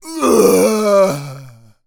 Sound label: pain, Zombies; zps; Z Cop